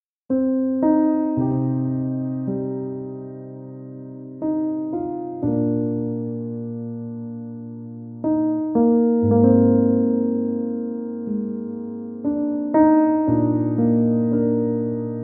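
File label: Melancholy, violin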